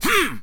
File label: jump, Human Male; zps; HumanMale-01